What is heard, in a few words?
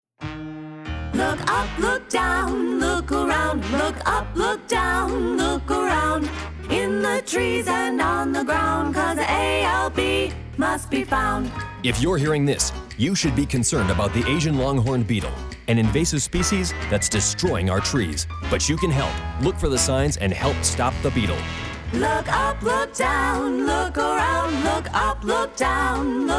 Jingle, Radio Mix; National; USDA